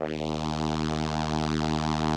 SOLINA, String Machines; Best Service; XX Large Most Wanted 2

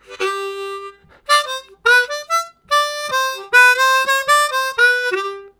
Harmonica, WAV Riffs; Zero G

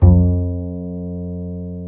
Bass, Roland; Club-50 - Foundations Roland; Ac Bass

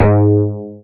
BAS FM DX-7

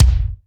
BD, Bassdrums, Roland TD-20

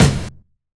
KIT, Dance Mega Drums, HIP; Best Service

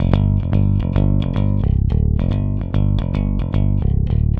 Rock, Bass; Bass X

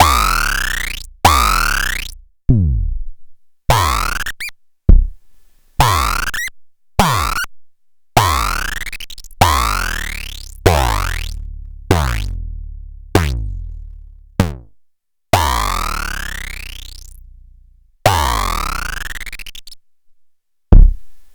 Kick Distorted Feedback; Sliced

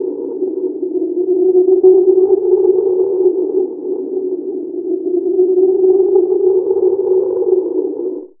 drone, Alien & Drones (atmo); ambient; Chillout

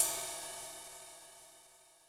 Sizzler, Yamaha DTXpress Cymbal

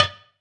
perc organic tunk

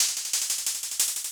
LOOP -L, Drum & Bass, 180 BPM; Ueberschall; Drum & Bass Resonance

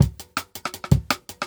Jungle Breaks

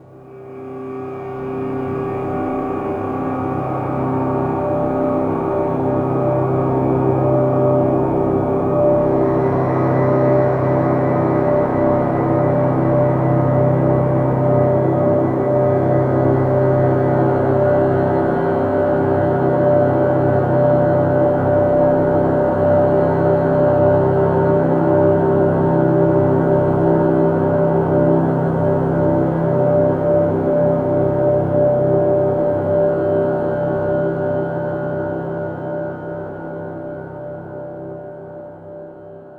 Long Drone, pad; Sonic Foundry (Sony Creative Software); James Johnson Spektral Minimalism; Pad FX

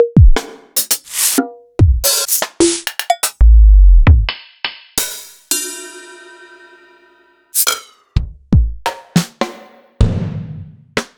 EMU Orbit

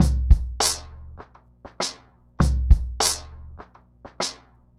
Remixed Drums 100BPM; The Roots Drumloops

